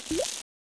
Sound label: tumble ring move